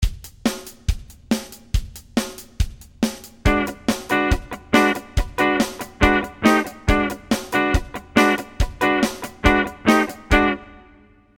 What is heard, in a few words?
9th chord funk, guitar; 9th chords are 7th chords with an extra note added on top.; funk rhythm guitar